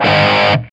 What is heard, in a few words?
SOLDANO ROCK, Guitars